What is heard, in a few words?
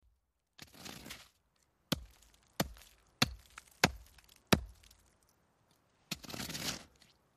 Ice Scrape Impacts On